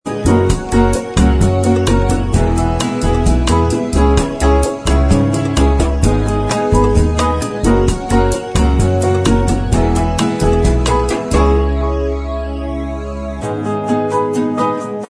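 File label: bossa mix instrumental track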